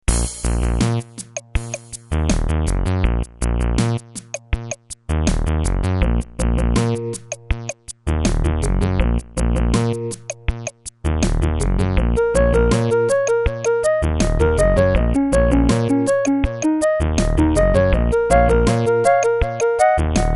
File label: city groove; Suitable for a menu or a Game/Level Complete sequence.